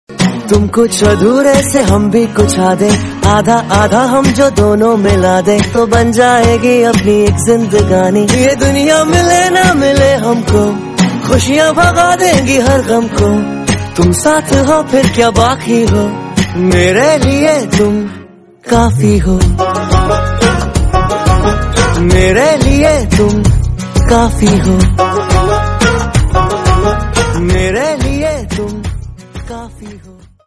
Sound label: new song ringtone hindi